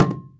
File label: WAYANG KULIT B5; Spectrasonics - Heart of Asia CD2